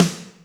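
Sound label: Snare Ambient Punch, Alesis D4 drum machine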